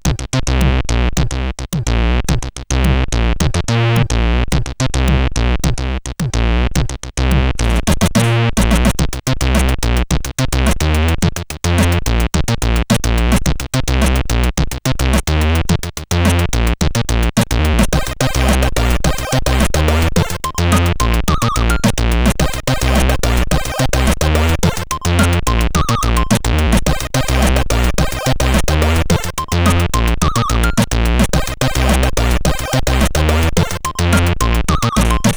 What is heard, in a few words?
Shorty 2, sid CSG8580R5